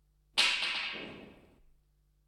Hausarbeiten Heimwerken Umfallender Besen

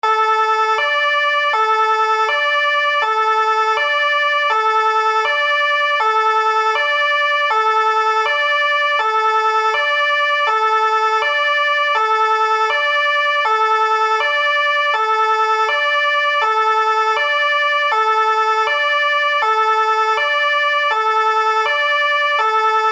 Martinshorn Einsatzwagen

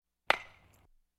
Sport Baseball Holzschlaeger trifft Ball

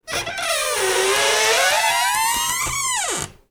quietschen

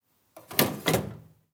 Schublade klein

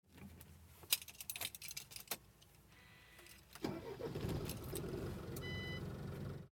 LKW starten innen

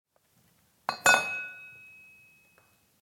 Metallstueck

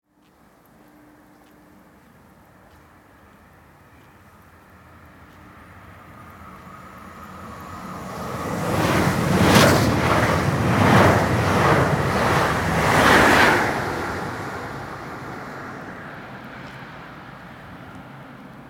Vorortzug

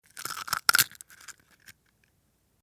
Eierschale

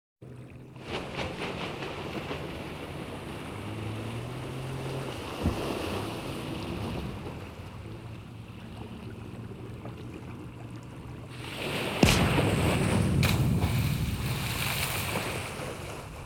Marine Kleines Boot Explodiert Und Sinkt

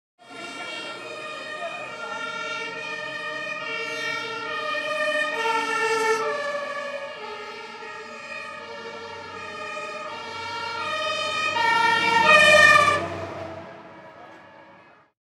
Feuerwehr

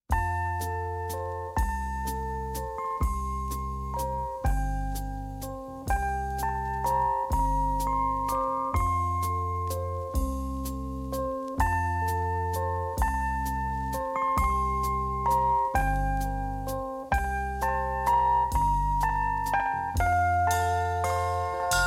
Stimmungen Wiegenlied

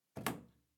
Kueche Sounds

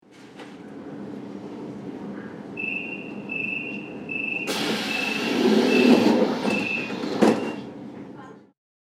S Bahn Tuersignal

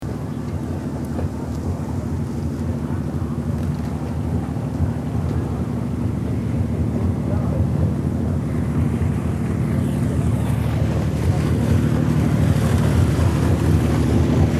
Schiff faehrt vorbei

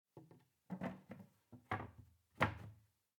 Kiste

